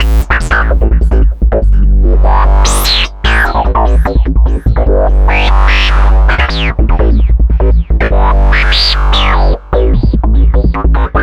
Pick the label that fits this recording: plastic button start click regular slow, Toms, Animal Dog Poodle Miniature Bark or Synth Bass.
Synth Bass